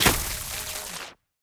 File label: Lightning Enemy Footstep; AudioWizards